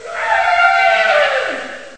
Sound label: elephant, mammals; animals; stamps